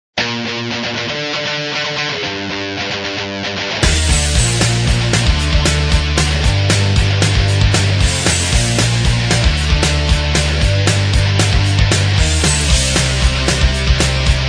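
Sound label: tv theme instrumental track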